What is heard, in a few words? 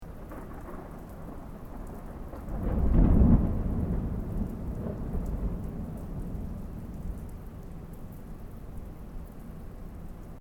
THUNDER DISTANT STEREO; Elemental weather effects